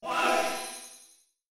crystal enemy; battle

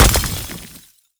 ore destructible

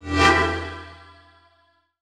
spear laser; boss; gynthar

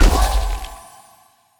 ball hit cold hard; CrossCode; battle

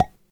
pipe Hit; World Of Goo

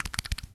wiggle; World Of Goo

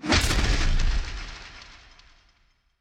impact; The Swapper

